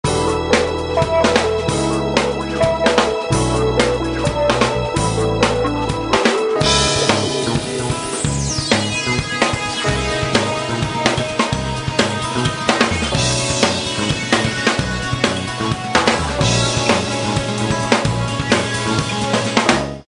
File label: Dance Loop; Motorola RAZR V3i